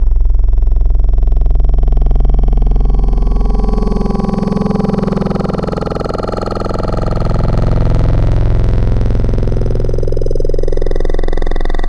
Spectral - Throaty; Wavetables; Synthetic's Serum Collection; ESW Core Wavetables; Echo Sound Works Core Tables

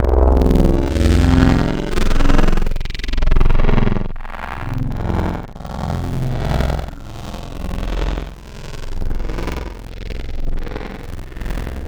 Mumbling Saw; Synthetic's Serum Collection; Echo Sound Works - Modular

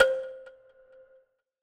BELL Kalimba Box; Synthetic's Serum Collection

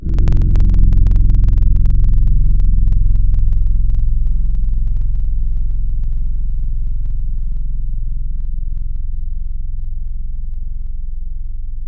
Rhodes Hybrid; Instruments; Synthetic's Serum Collection; Echo Sound Works Core Tables

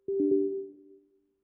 Windows User Account Control

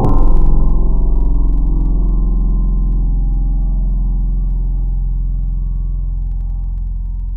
Bouzouki; Synthetic's Serum Collection; Chill Trap 2 Tables

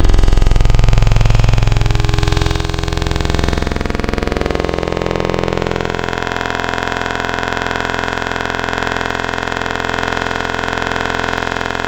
Growl Vocal Nodes; Synthetic's Serum Collection; Echo Sound Works Core Tables

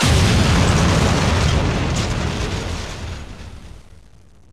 engine; O2JAM

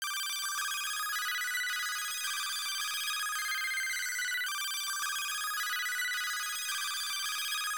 synth

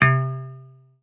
Feel Good Guitar C2; Synthetic's Serum Collection; Aubit; Awake Vol. 1 Serum Noises

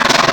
Foley; Synthetic's Serum Collection; Cymatics - Foley Wavetables Vol 2

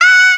Noise F#; Synthetic's Serum Collection; StiickzZ - Sticky Future Bass Vol. 2

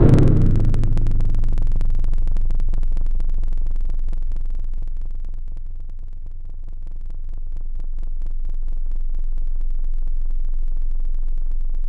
Metal Bowl; Synthetic's Serum Collection; Echo Sound Works Sphere

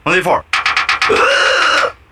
Forest Grindcore; lolicore; Live In The Woods

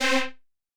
Serum Lead, Wavebabe Lead; Synthetic's Serum Collection

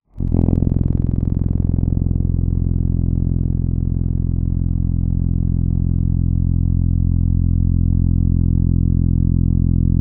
Simple Brass; Instruments; Synthetic's Serum Collection; Echo Sound Works Core Tables

